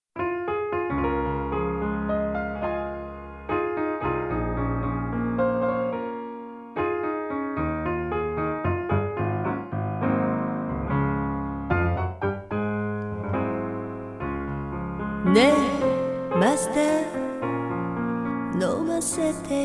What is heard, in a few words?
blues, ukulele